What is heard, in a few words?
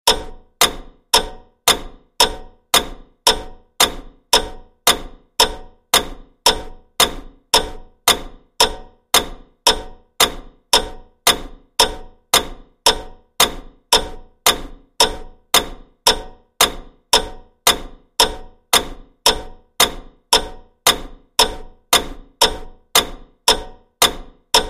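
Clock, Ticks; Czech Wall Clock, Dated 1830, Ticks Constant With Light Metallic Mechanism - Looped; Clock 6, Bozak, 1830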